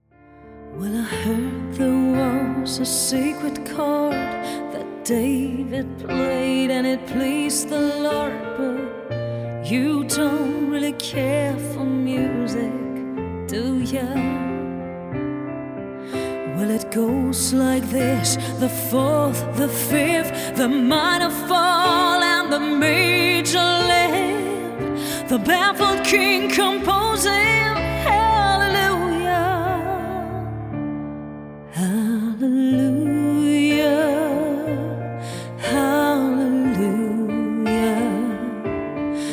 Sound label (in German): Halleluja